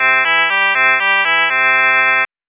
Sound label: melody, shepard tone